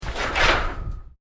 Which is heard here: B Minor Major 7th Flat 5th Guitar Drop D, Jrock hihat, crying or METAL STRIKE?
METAL STRIKE